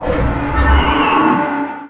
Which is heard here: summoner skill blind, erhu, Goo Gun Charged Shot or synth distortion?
summoner skill blind